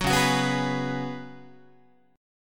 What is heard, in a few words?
Db 7th Suspended 4th Db down Guitar; strummed